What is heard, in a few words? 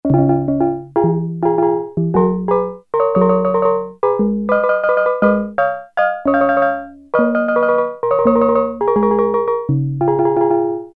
Steel Drums, Prophet-5; Sequential Circuits Prophet-5 (Ver.3); Factory Patch; File 3 Programs